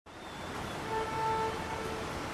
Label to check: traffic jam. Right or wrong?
Right